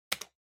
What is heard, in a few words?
PC-Keyboard (Enter-Mid)